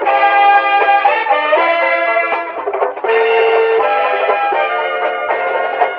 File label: horns, phonky loops